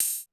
Dance Kit, Korg 01W

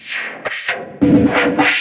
Rowl, Synchro Funk, Beats; Sonic Foundry (Sony Creative Software)

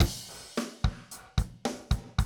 110, Classic Drum Loops

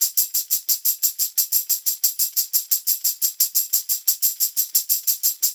loop, percussion; InVision Interactive; Percussion Slam; BREEZE

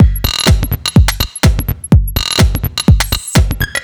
125bpm, House Drumloops

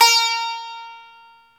SITAR, Plucks; Eth Plucks, Pluck Duo

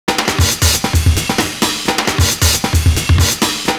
MUTE CRASH

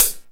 OHH, Roland JX-305

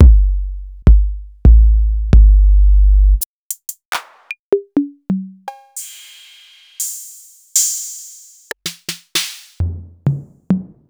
AcidLab Miami

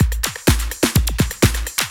loop, 125; Sound & Vision - Gigapack I

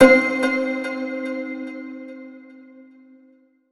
Pluck 11 - C; 99Sounds; Monosounds; Music Loops; Instrument Oneshots

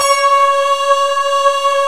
SYNTH CHOIR, D - 70; Rubber Chicken Software Sample Bank

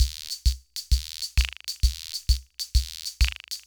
Loop, sr-95; classic machines

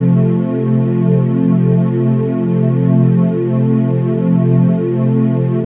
A, Drum & Bass Pads; Total Drum&Bass; 90 s Sample CDs

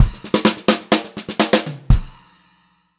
126 PO5, Pop-Rock Drumloops

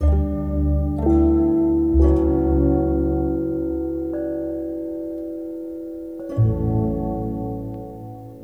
Gong Song; Sonic Foundry (Sony Creative Software) - Friendly Fire

